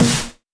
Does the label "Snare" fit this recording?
Yes